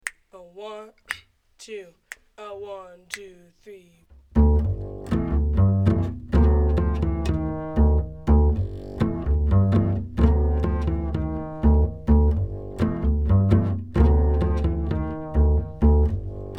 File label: minus Melody